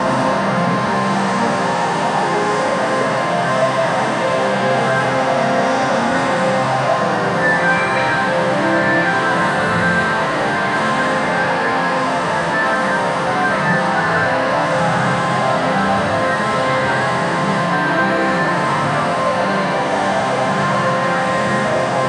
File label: ambient sound, slots